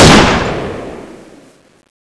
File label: stun rifle